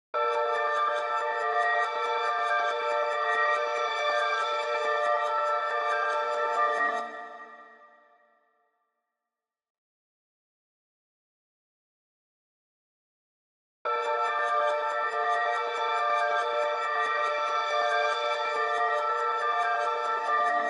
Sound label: 140 BPM D Min Lead, Hip Hop; Cymatics; Mercedes